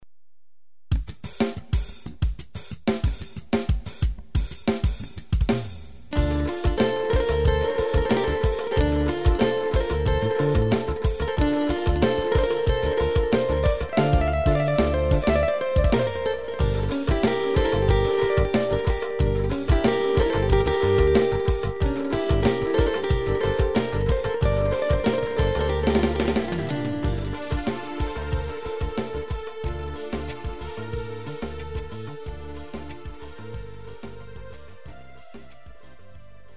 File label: tr funk sx 3000